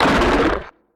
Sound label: HAMMERHEAD damage; Hammerhead gold